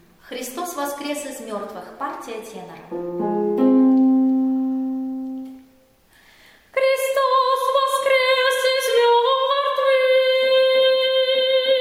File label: tenor; epiphany; Xristos Voskres Iz Mertvix